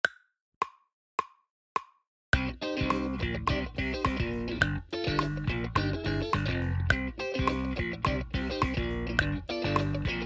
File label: la Brea drumless